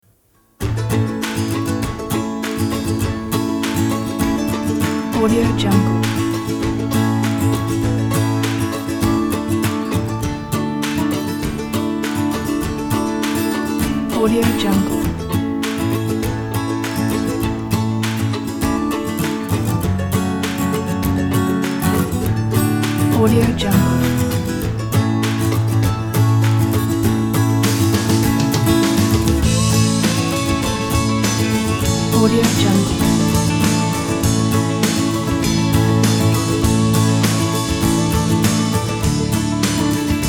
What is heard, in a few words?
uplifitng country